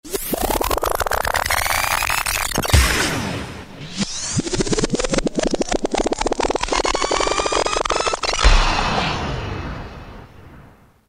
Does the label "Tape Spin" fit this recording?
Yes